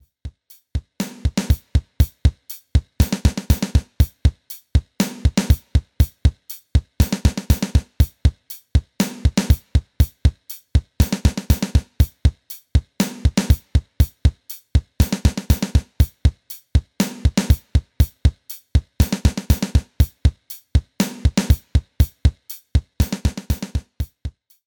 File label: Messing With Pad Mapping